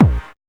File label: HSE BD